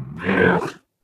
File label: ballbot meh